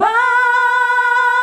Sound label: "BAH" B, FEMALES; Best Service; Hyper Dance